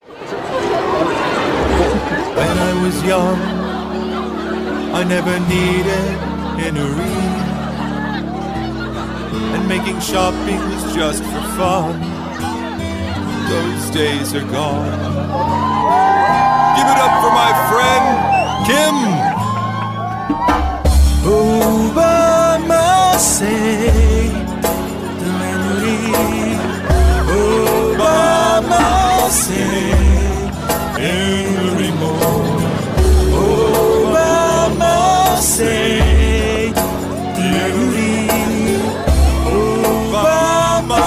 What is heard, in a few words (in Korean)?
영어남, 노래; 남자